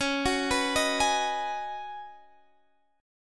C sharp 7th Suspended 2nd Suspended 4th, C sharp, down; arpeggiated